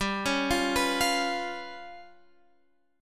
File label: G 7th Flat 5th G down, chord; arpeggiated; JGuitar